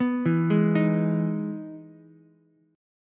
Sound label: E Major 7th E down Ukulele; EM7 arpeggiated; JGuitar